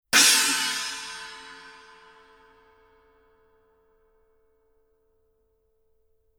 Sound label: 900 series 14 china edge, paiste